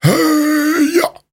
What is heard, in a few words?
Grunts and Chants, Viking Vocal